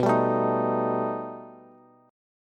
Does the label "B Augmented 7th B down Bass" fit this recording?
Yes